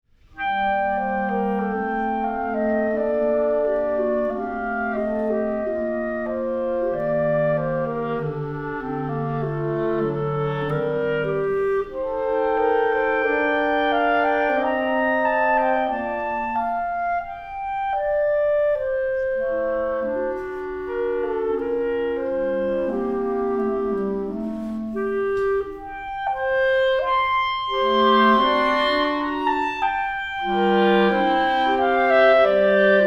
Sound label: quartet pavane